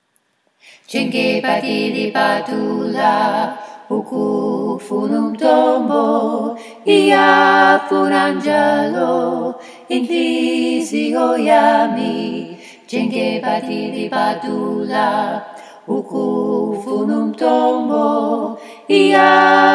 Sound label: ALTO TENOR BASS